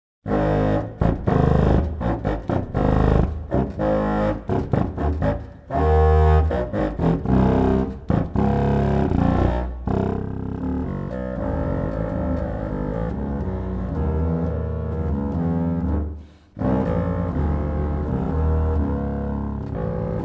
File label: Bb Subcontra